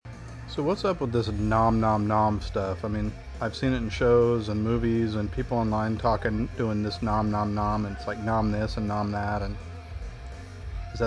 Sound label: nom nom nom